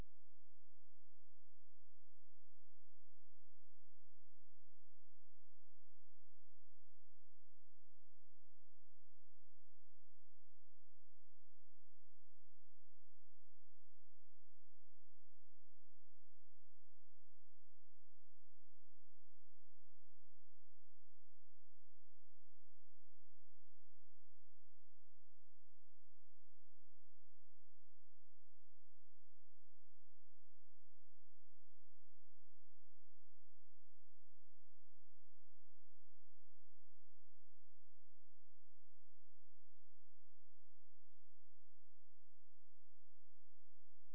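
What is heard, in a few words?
hydrophone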